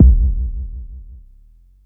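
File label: Mod bass drum, Jomox Airbase99, Drum Machines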